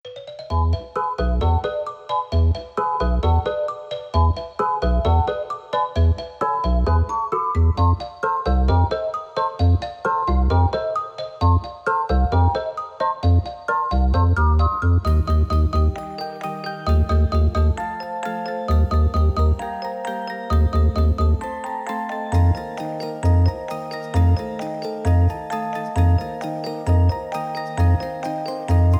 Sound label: Multiplayer Round Interval; Picross X Picbits vs Uzboross